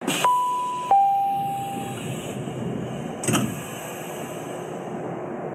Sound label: Door Close